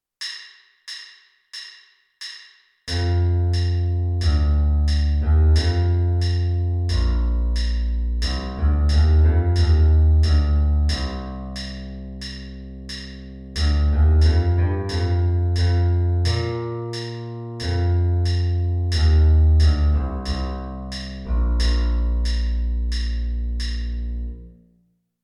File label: electric bass